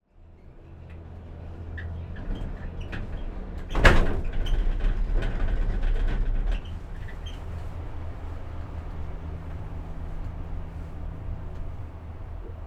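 Gondola, Interior, Medium, Size, Going, Up, Low, Rumble, Metal, Rattle, Winter; Rzpost; The Gondola Lift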